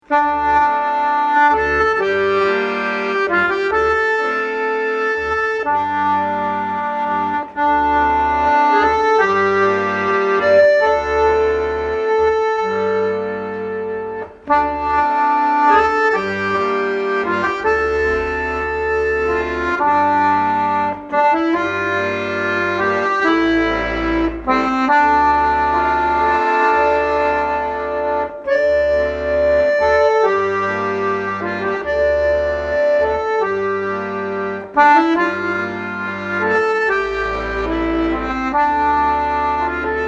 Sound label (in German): Summer Simphony Akkordeon Komposition